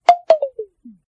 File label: Low Battery; AirPods